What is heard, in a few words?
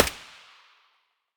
aug silencer distant; STALKER; IWP